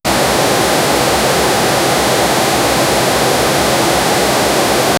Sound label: assembly line running